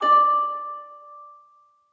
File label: melody D5; fairymirror